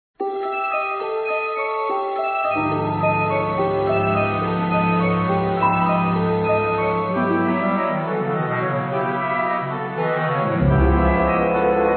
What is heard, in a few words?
bgm